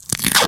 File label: Duct Tape; StecMetronome